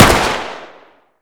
fire, weapons; cw fiveseven

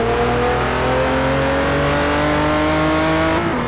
third, vehicles cars; lwcars; spy c8

